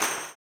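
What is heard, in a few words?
tambourine, 160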